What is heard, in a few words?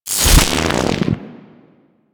Infested Arc Rifle Fire Stop B; Warframe; Weapons